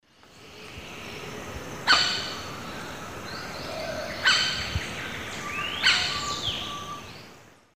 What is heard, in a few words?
Piaya melanogaster, call; S. America; Eastern Lowlands